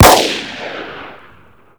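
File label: snub revolver shoot; tf2classic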